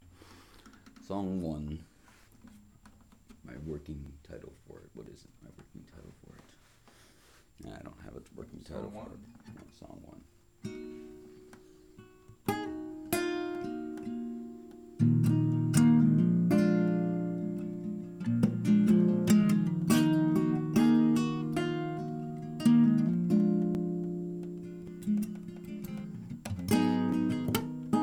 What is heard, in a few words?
jam song